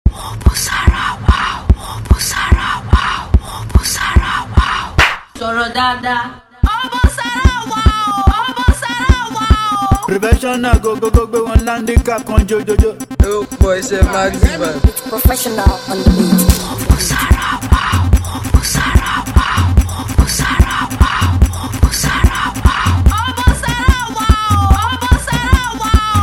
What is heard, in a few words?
Professional Beat